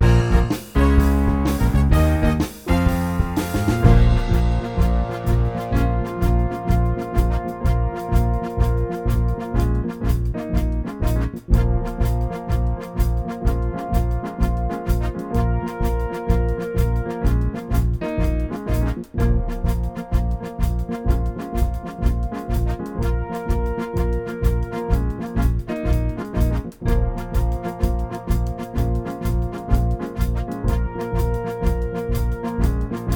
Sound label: news intro underscore